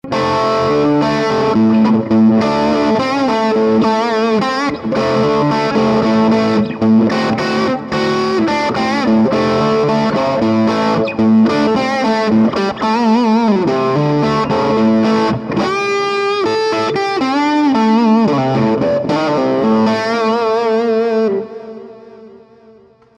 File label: Bridge gain, guitars